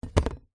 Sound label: household kitchen knife metal set down on cardboard box; zapsplat